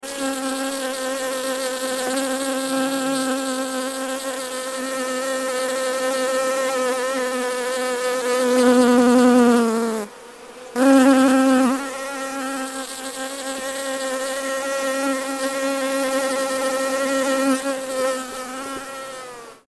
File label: Hear a yellowjacket here; The Yellowjacket is a member of the wasp and hornet family Vespidae